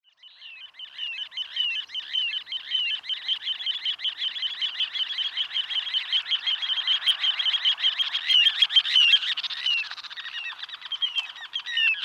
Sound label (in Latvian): songflight, balsis; rodbyhavn; a.celmins